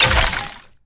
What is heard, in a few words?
bone death